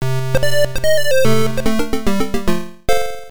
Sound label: Game Start ~ Level Complete